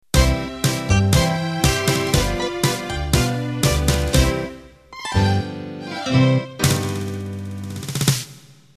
Tango Ballroom End

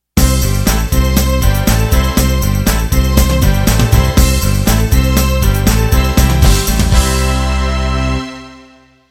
Motown End

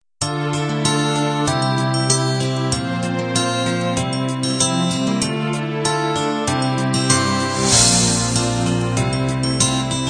diva intro

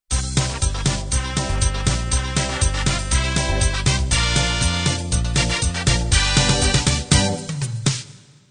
2beat 60-70s intro

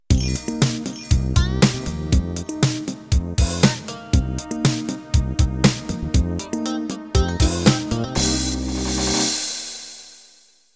Hip Hop Soul end

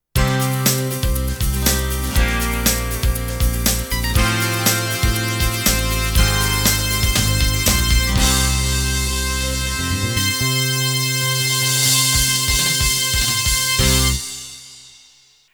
Fire Rock End

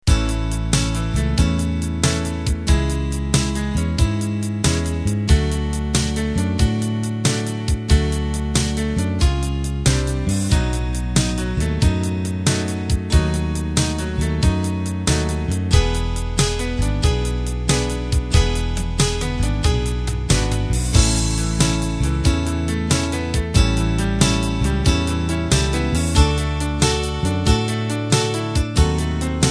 Unplugged Slow Rock; Steel-Stringed Guitars that will work great for dozens of those "Unplugged" rock/pop/c&W 6/8 and 12/8 Ballads

